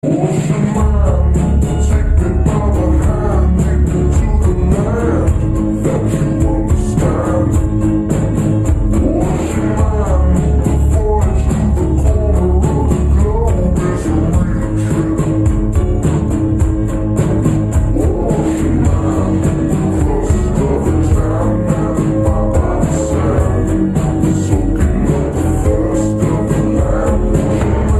Vaporwave

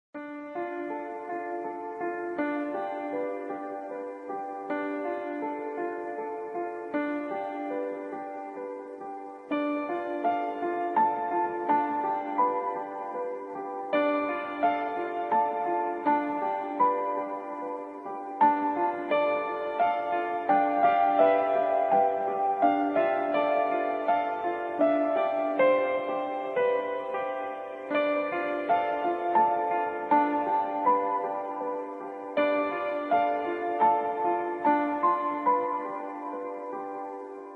piano version

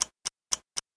Ticktac msg tone

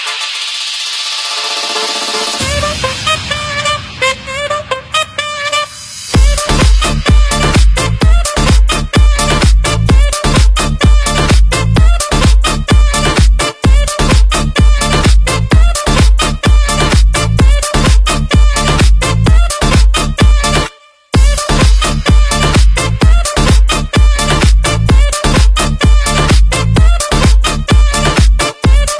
Saxofon

